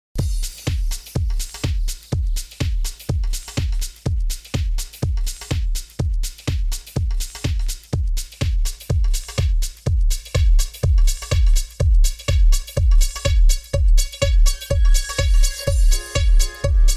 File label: Flanger; Novation Twitch